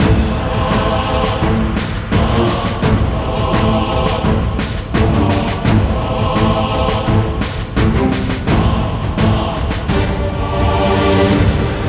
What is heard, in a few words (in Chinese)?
Boss音乐